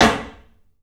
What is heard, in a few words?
CLUB SNARE; 3D Sound; 3D Drums